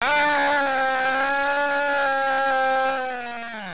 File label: ahhh